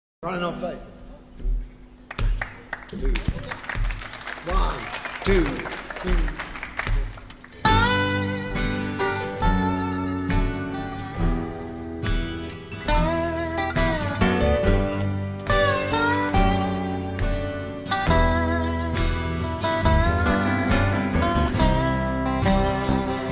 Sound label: Acoustic, Live